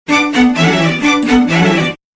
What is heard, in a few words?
ringtone